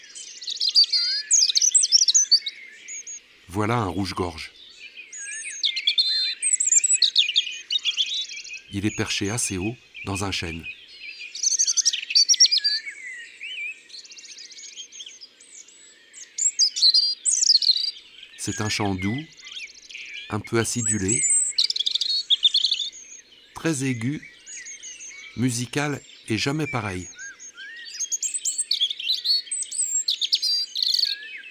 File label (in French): Rougegorge familier ABC des chants d'oiseaux